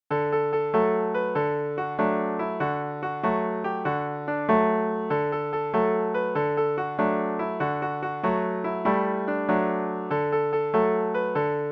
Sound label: wee falorie man piano